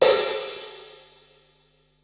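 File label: hi-hat open; 300 Drum Machines; Yamaha Motif ES-Series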